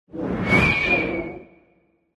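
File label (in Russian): порыв ветра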